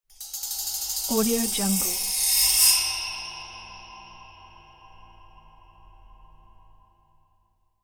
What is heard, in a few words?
reverberant metal rack scrape; futuristic; کشیدن فلز روی میله های